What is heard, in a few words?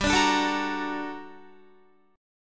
G Minor Major 9th, down, Guitar; GmM9 strummed